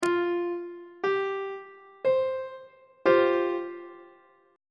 d double flat suspended 4th 1st inversion on treble clef